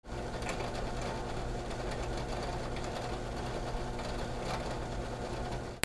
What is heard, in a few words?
INDUSTRY